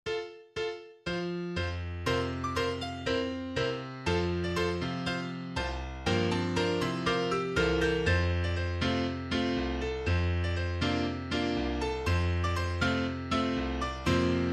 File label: cheerful 3 pianos